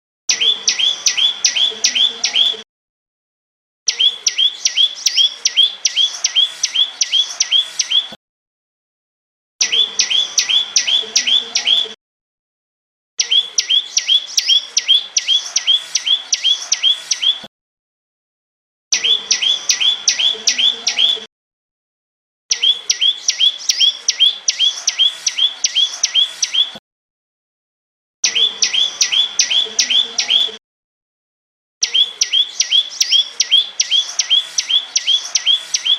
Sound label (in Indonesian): Suara Burung Prenjak Lumut